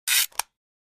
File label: Flash Gear High Pitch, flash gear